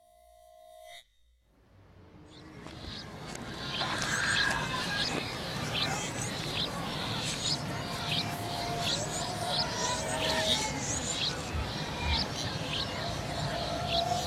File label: piano